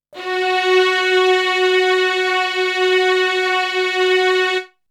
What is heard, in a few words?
Strings; ZERO G - Datafile DISC 3